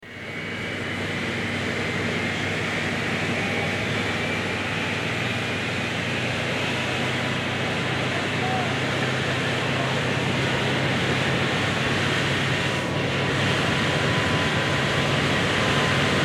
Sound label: CONCORD RB olympus 593